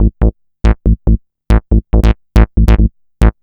Synth Bass

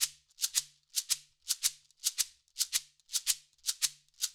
Shaker, acoustic percussion loop; Drums & Percussion; Percussion Loops

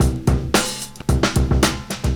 Breakbeat, Drums & Percussion

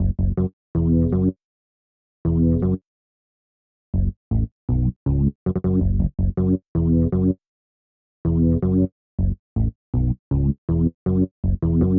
Synth Bass

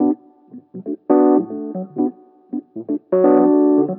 E. Piano

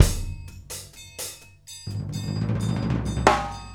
Acoustic Drumloop

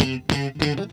Funk Guitar; Stringed Instruments

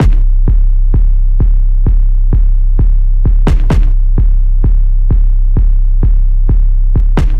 Electronica Drumloop

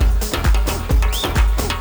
Dance Drumloop

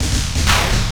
Dance Drumloop; Drums & Percussion

